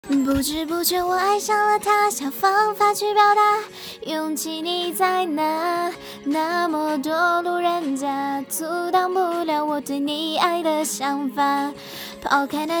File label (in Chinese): 中文歌